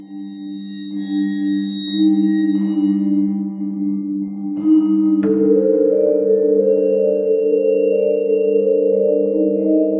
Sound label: horror; windah